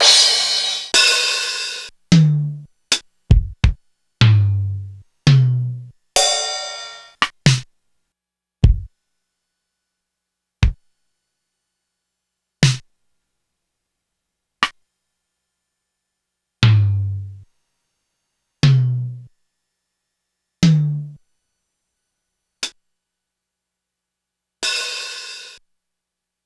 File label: Korg DSS-1